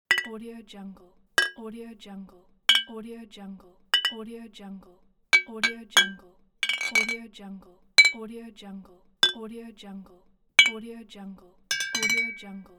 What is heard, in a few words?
bottle clink